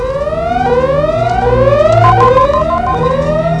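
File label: yellow alert; Star Trek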